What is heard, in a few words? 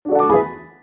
rainbow get; merge-dragons-2